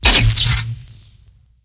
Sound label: Spark Attak